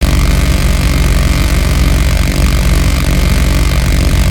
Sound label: machine gun loop stereo; silverback